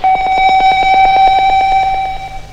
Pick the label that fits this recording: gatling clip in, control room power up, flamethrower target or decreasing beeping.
decreasing beeping